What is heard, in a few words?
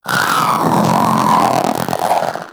Zanuka Companion Vocal Angry; Pets; Warframe